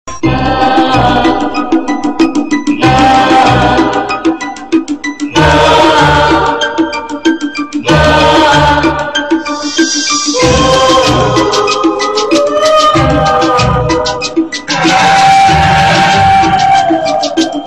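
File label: ssshhhh koi hai horror sound music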